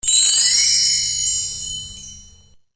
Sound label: Magical Msg